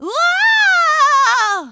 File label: waaaooow; pauline; SM64 CO-OP